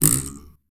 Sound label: spring